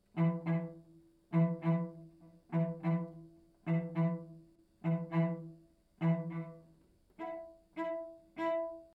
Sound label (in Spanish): violoncello